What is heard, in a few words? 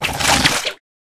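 Wild Flower attack Mid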